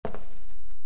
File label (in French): pas chro pierre; WehikulCzasu; Time Machine; Bruitages